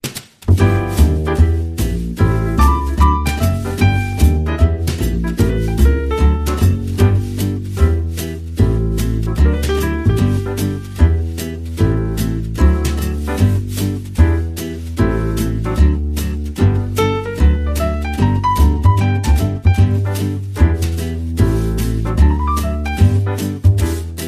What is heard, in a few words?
Rhythm Section Only